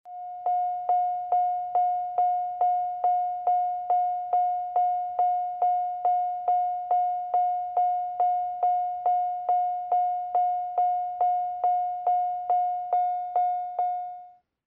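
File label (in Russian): звуки дверей автомобиля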